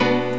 E Piano

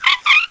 bibip; GEOGRAFIA

